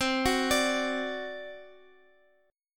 C Suspended 2nd Flat 5th C down Guitar; Csus2b5 arpeggiated

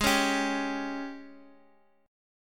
Gsharp Major 7th Suspended 4th, Guitar, Drop D

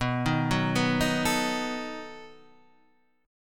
B 7th B down; B7 arpeggiated; JGuitar

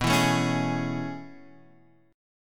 A Major 7th B; strummed; JGuitar

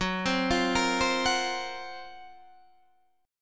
Gb Major 7th Flat 5th Guitar Open D

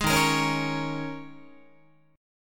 D sharp 7th Suspended 4th, Guitar

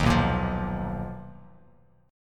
B Major Sharp 11th B, Bass Standard; BM#11; strummed; JGuitar

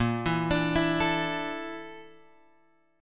A sharp Major 7th Flat 5th A sharp; A#M7b5 Chord {6 7 X 7 5 5} arpeggiated

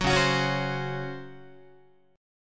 Db Major 7th Suspended 4th, Guitar; strummed; JGuitar

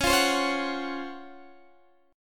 C sharp Major 11th, chord

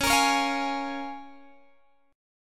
Db 6th, Guitar, Open G

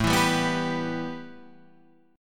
A 7th Suspended 2nd, A, down

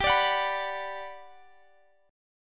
G Major 7th G down

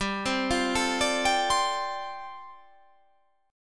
G 9th Suspended 4th

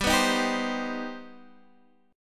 C Minor Major 7th G

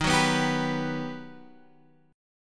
G Augmented Major 7th

